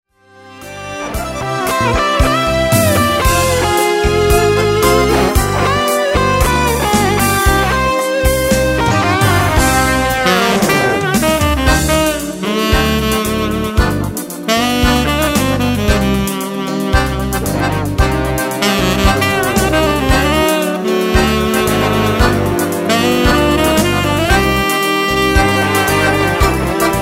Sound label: golden sax